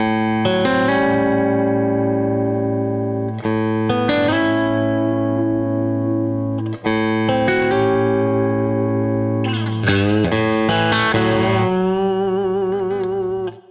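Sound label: Country Guitar Intro; Digidesign; Pro Tools Essential Loops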